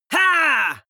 male fight shout; vocals; comedy-cartoon; soundpool

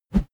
whoosh bamboo

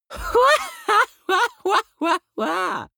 laughter prig; vocals; comedy-cartoon; soundpool

